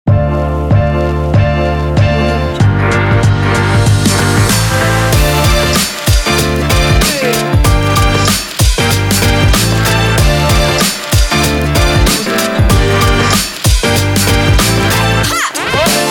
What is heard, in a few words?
urban hiphop logo